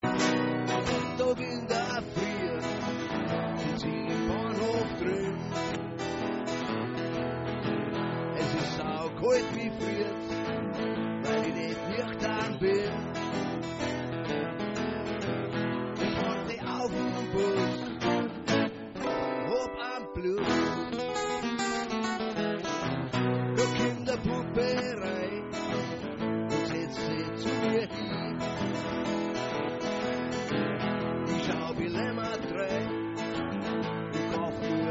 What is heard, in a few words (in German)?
Bahnhofsblues